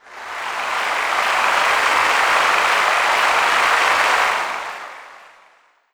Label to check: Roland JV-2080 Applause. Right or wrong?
Right